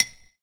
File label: wrong; Imperial Sudoku